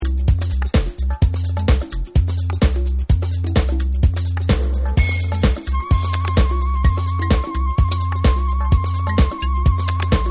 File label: Flute Dub